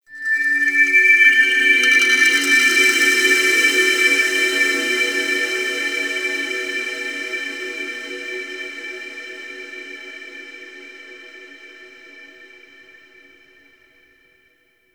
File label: Kalimba; Warframe; Ambience; Nokko Colony